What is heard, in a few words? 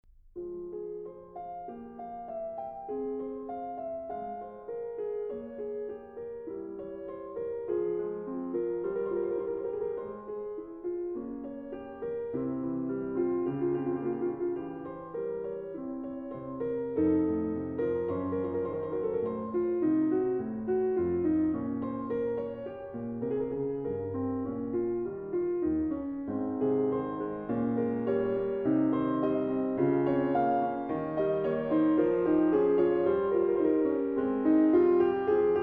Prelude in F minor, The Well-Tempered Clavier; BWV 857; The Well-Tempered Clavier, Book 1; Angela Hewitt